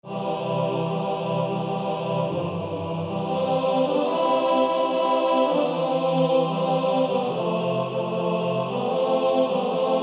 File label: TBB